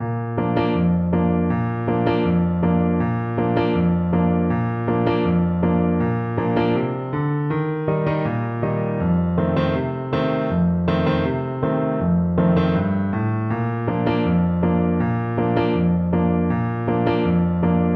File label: horn, French Horn